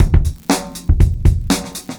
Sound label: LOOP, 70's Breakbeats; USB Soundscan vol.46; AKAI